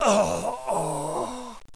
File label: death